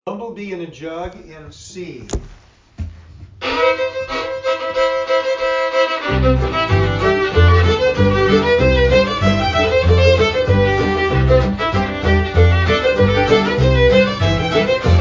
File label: Jug, Stringband